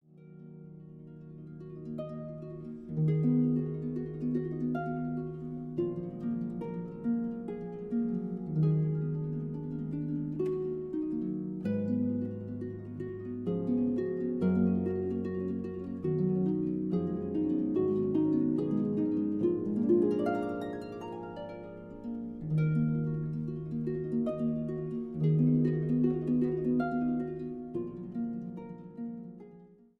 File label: harp; Zabel; Marguerite Au Rouet